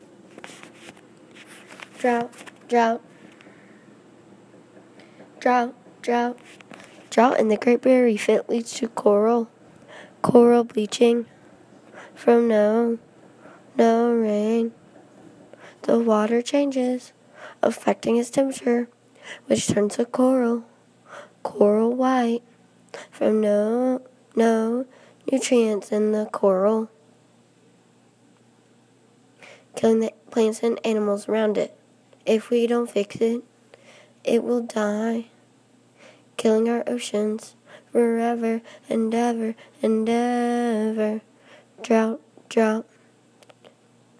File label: great barrier reef song recording